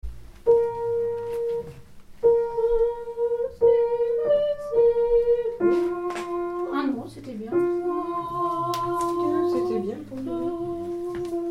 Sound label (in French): Trisagion ensemble